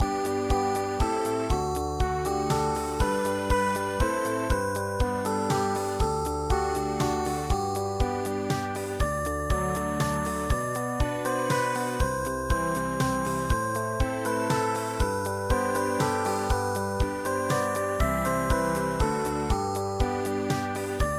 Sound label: ballade, midi; Old Swiss national anthem